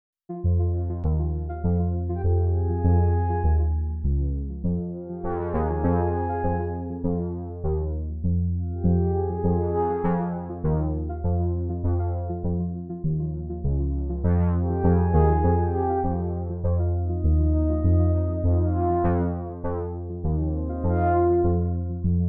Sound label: Organ Coil